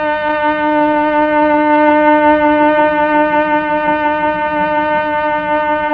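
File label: STRINGS Mellotron Cello D, Mellotron Slow Combo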